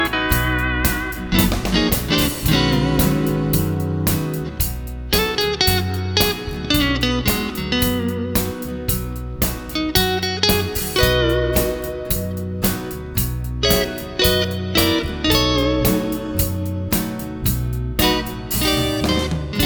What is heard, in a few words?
Lead Guitar With Backing, Tele Bigsby